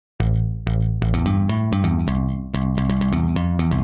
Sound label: Bass riff